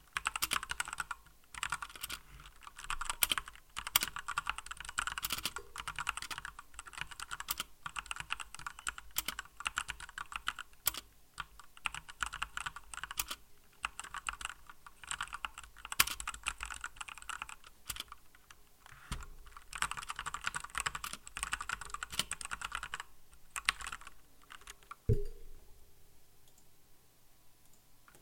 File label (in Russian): zvuk mehanicheskoj klaviaturi zapisannij v moej komnate